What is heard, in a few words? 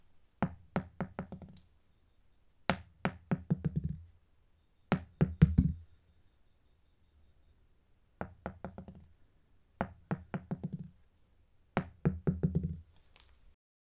Ball